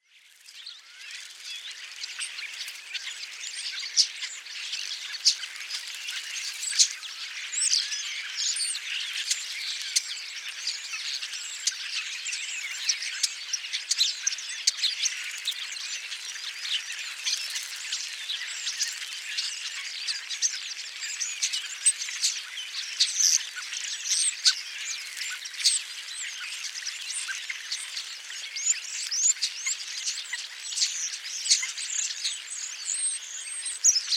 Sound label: european starling flock calls; rob van bemmelen